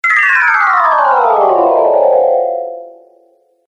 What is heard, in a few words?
Magical Cascade Down; Magic Sound Effects